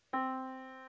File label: yamaha Disk